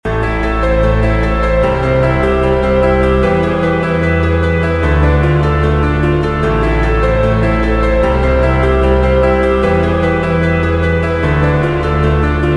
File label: Boss No Drums; Catmaze